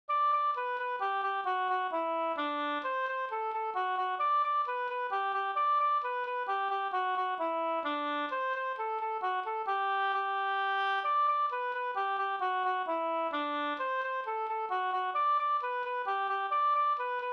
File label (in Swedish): mazurka; fr Knared Hl Nr1